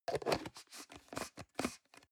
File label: plastic can small lid screw close medium